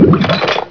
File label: gurgle